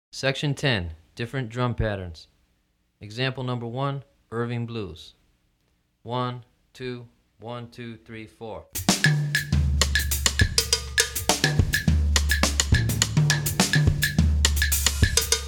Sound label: Afro-Cuban Rhythms For Drumset, Two Patterns; Two Patterns by Frank Malabe